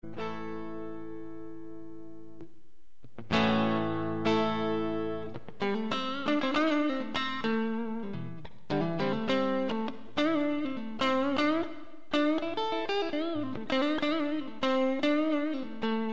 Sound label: NPN Boost, Bypassed, then low boost, then high, Strat; Gus' NPN Boost; Recorded by Gary Burchett